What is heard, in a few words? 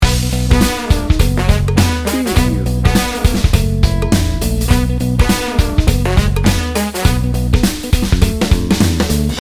funk n brass loop